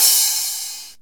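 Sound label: soft crash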